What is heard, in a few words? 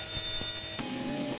open; Pioneer AVIC N1